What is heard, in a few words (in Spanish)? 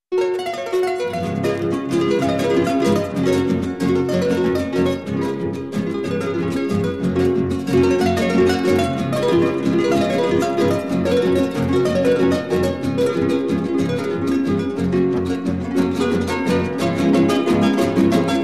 flauta guitarra y arpa de los andes